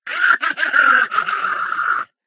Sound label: ballbot jump short